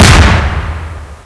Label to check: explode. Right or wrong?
Right